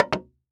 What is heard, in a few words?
Foley Footstep Cowboy Boots Single Step On Wood Board Walk; Foley Footsteps